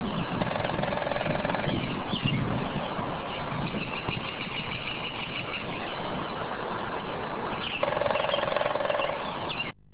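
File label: woodpecker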